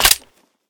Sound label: expl; jam it in; explodiumgun